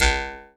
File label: C# JAW, Primitive Instruments